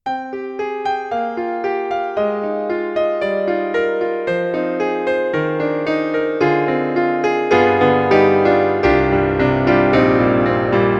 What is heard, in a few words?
Kurzweil MicroPiano MP-1 Sustain Piano